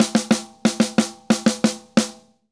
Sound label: Drums Poly; Best Service; Festa Latina VOL-1; Drums & Percussion